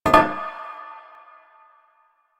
Stone Cafe Table Impact; Warframe; Nineteen Ninety Nine

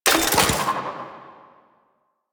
Mech, Spear; Warframe; Weapons; Tenno; Priest; Scourge Prime

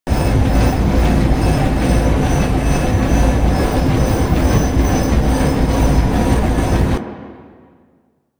Big Orb Machine Loop; Ambience; Props; Loops; Warframe; Eidolon Plains

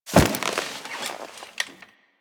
Classroom Chair Sit; Ambience; Warframe; The New War; Quests; Zariman

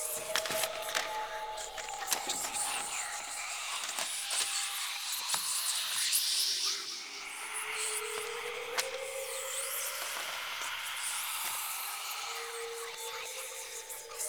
Quest Discovery Loop; Orlick; Friendly

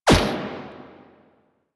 Fire; Warframe; minigames; Caliber Chicks minigame; boss

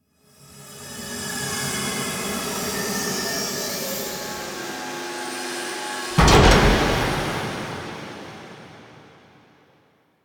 Pod Close; Cinematics; Operator; Warframe; Orbiter Cradle

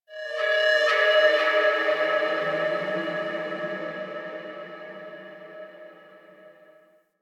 Texture Medium, Ambience; Sentient Lair; Warframe

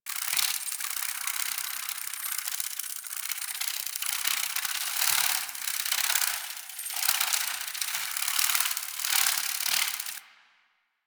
Garden Butterfly Loop; Warframe; Ambience; Clan Dojo; Dojo Garden Animals

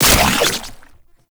Infested Shotgun Reload; Weapons

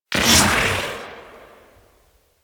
Grenade launcher Tail; Warframe; Weapons; Corpus

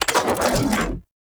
Arch Gun Primary Beam End, Weapons; Warframe; Archwing; Hildryn

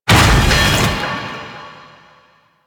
Undertow Impact; Warframe; Warframes; Pirate

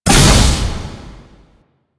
taller door open; ambience; Warframe; Entrati

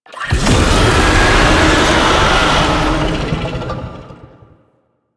Tank Infested Vocal Death; Enemies; Barks; Warframe; Lasrian

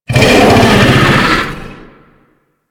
Infested Bone Blade Vocal Soft; Warframe

